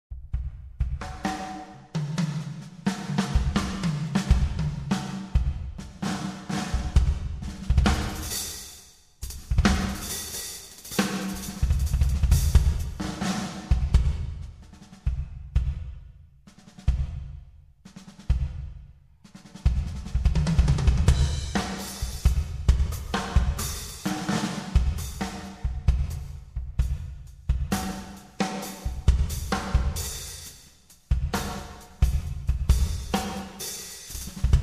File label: solo